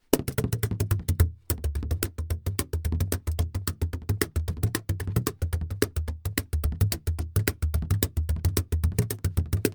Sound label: bodhran